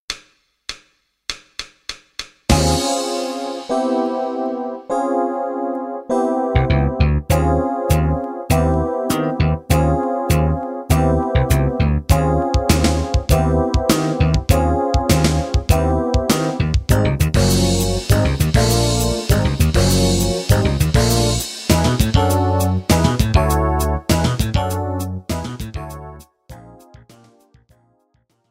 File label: jazz time